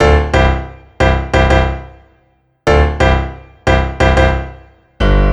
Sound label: Stacc Piano